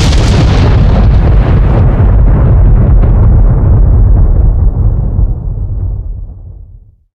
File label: electric discharge; PYLON; Barotraumatic